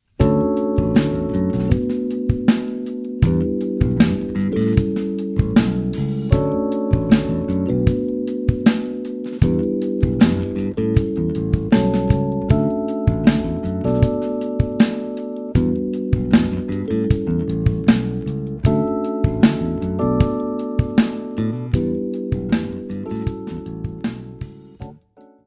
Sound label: Trio